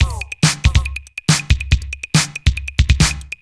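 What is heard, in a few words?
DRUMLOOP ELECTRO 140; Techno2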